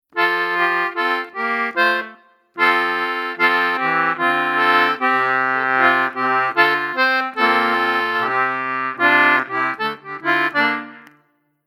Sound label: bonnets single slow; morris contemporary